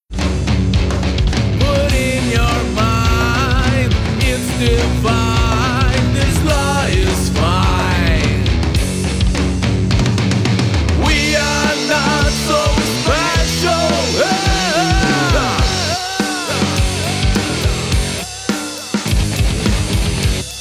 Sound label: Metal